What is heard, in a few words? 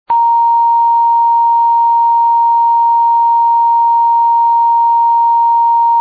A sharp 2, recorders; tuning